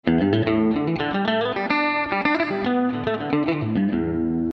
Pentatonics Up Down; open string awareness; ragajunglism

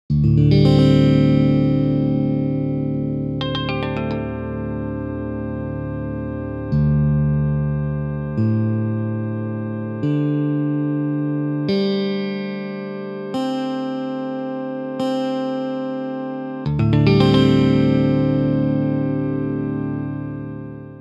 Crowd DADGCC; crowdsourced tunings; ragajunglism

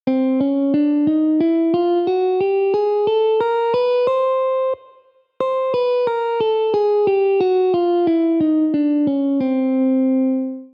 Chromatic; scales

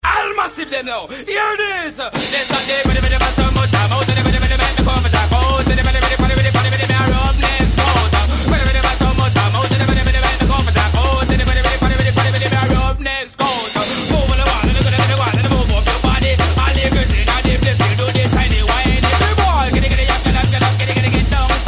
vocalisations; raga jungle turntables tablas talas

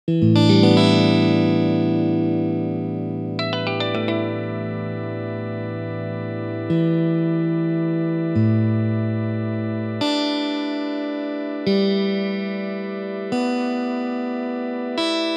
Crowd EADGBE; LOUDER; crowdsourced tunings; ragajunglism

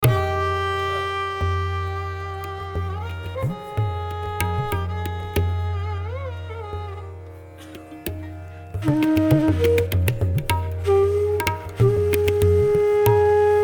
EKTAL Jog

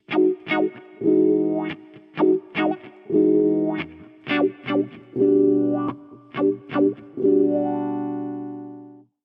Wah Chordal; creative wah pedal use; ragajunglism

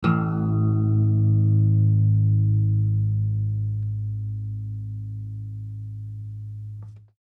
Inharmonicity

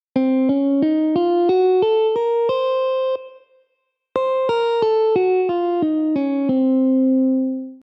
Locrian Natural 6; scales

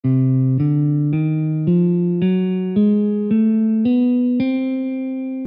arabian; global scales

